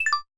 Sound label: sparkle falling